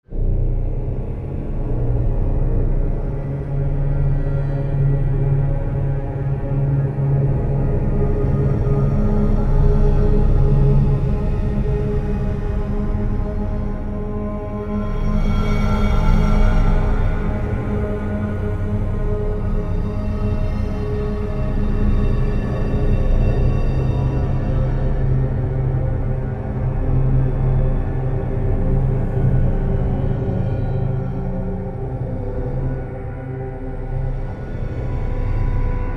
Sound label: dark thriller castle atmo orchestral drone; Ambient music; Music for new maps